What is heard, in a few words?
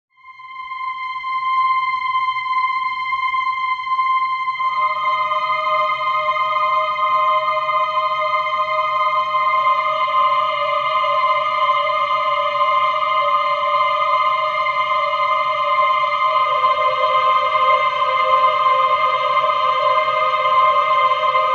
ambience horror; Thriller; Drama; film music